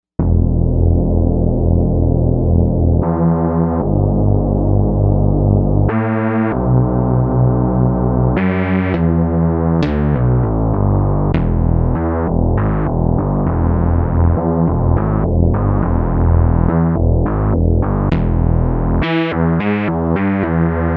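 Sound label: Chorus Bass legato, Novation UltraNova; Factory Patches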